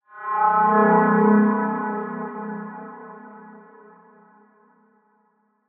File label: sinister